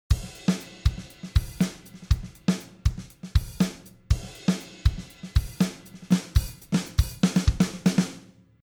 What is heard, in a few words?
drum software instruments